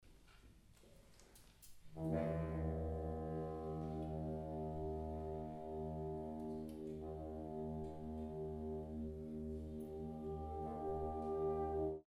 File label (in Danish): Fagot kiks